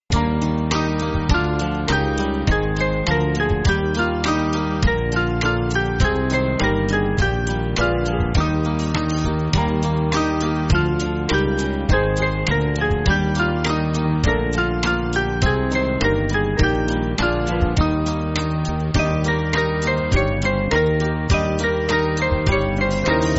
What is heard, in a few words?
Band, Music Backing Files for Churches; Let Us Join; Love Feast